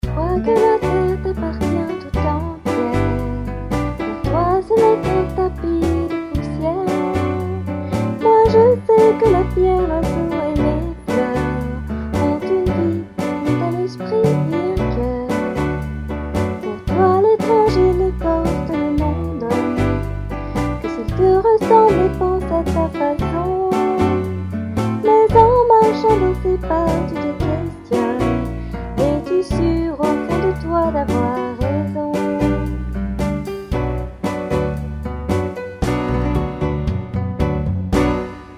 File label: Sad and Jazzy; version "jazzy"; Projet Songsmith